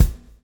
swing

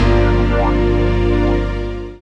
SYN DANCE; Sound & Vision - Gigapack II

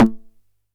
909 SD Tape; Goldbaby Tape808 & Tape909; The Tape 909

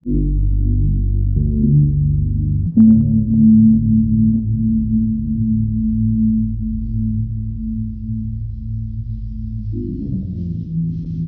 Deep Gtr Bass; Sonic Foundry (Sony Creative Software); Knutrix Drum & Bass

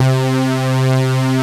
JP BRIGHT, SYNTHS; Pro-Rec - Synthsonic

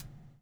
BUBBLE; Spectrasonics - Backbeat

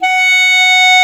clarinet F#5; East West; Global Sounds

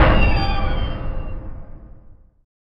junk, Impacts, Hits & Booms; Distored Reality

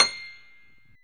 PNO, Piano Layer

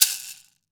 wood shaker; AKAI S-Series CD-ROM Sound Library VOL-5

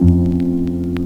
HIT, Hits & Brass

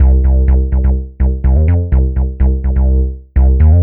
Bassline; Drums & Drones

